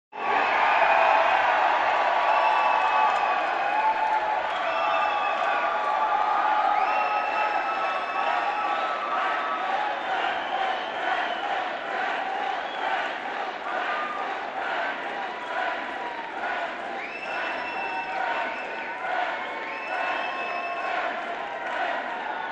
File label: Crowd cheering and chanting